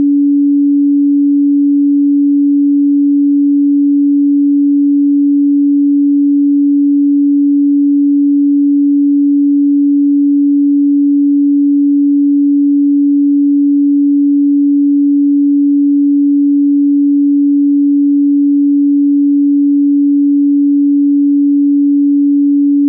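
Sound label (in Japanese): 285 Hz, ソルフェジオ周波数 信号音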